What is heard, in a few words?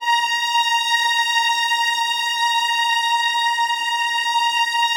Violins, A#2, Classic Orchestra; Best Service ProSamples vol.51